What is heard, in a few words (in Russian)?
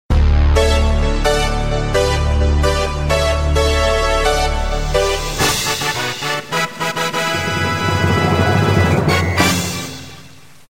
fanfary